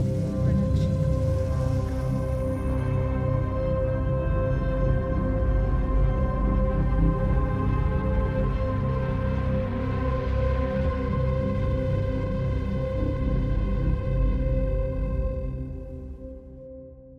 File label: Suspenseful Ident; soundscrate